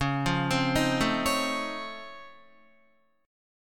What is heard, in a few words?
Db Augmented Major 9th Db down Guitar; arpeggiated; 4E,4A,5D,5G,5C,6F